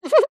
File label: cartoon character cute high pitched shy laugh